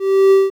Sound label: Boop